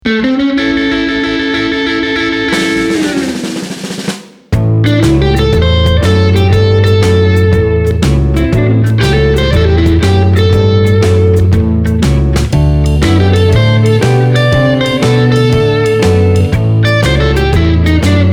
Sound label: blues